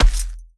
Footsteps Dirt Run; The Town

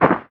Ground short; Devour

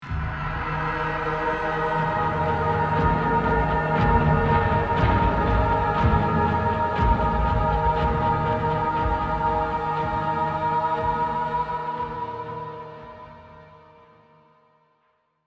Amb Success; Amnesia: The Dark Descent

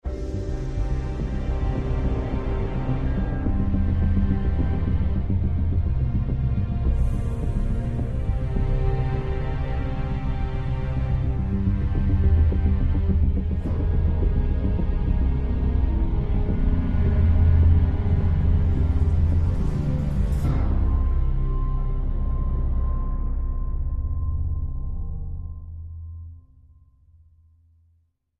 Tense

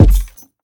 Footsteps Wood Run; The Town

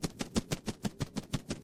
Blue Wing Loop

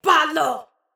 Attack Vo; Paladin

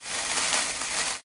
Fuse; Doodle Jump 2; Android

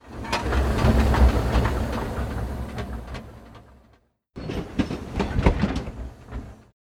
trolley

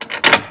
Door Open

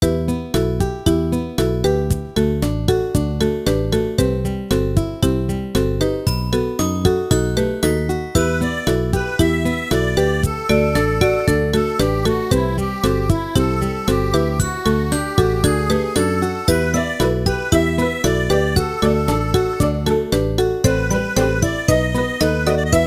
Music Woods; Miffy's World; nds

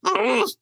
inmate pain; devour 2021 gamerip pc macos

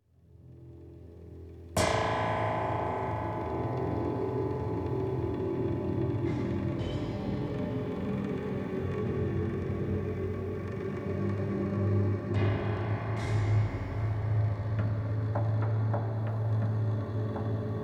Street Scene Loop; Storytime; Dreamfall Chapters

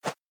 card fly

